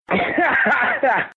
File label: Big Beast Laugh